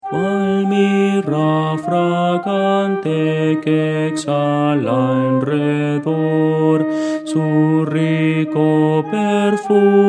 contralto; Voces para coro

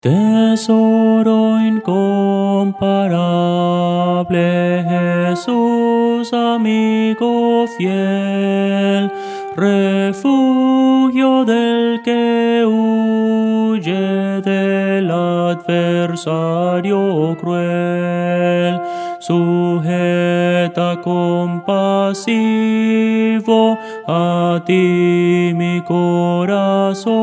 melodia, himnos cristianos; tesoro incomparable

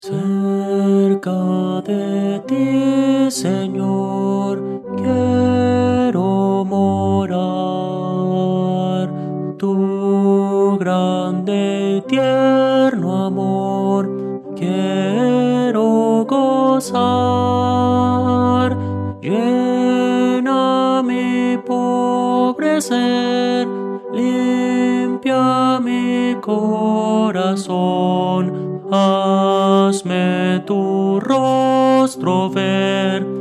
tenor

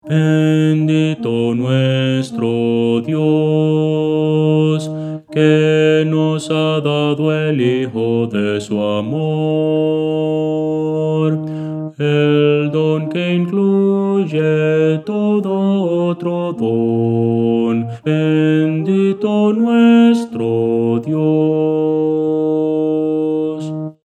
bajo

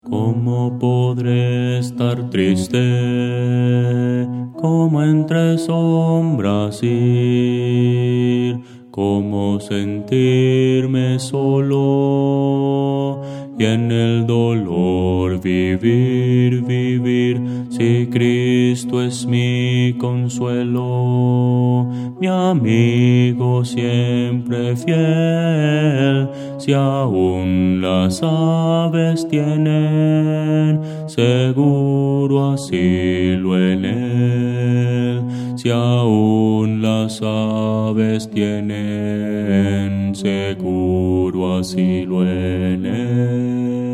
bajo, himnos cristianos; como podre estar triste

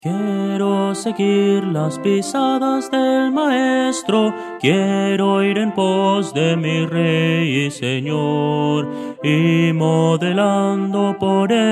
melodia

